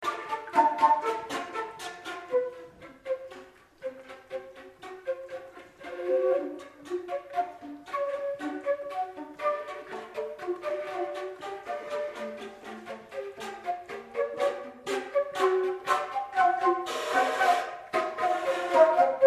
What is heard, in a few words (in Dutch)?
4 fluiten